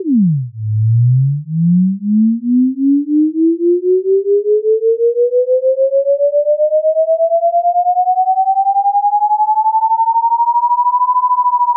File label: BINAURAL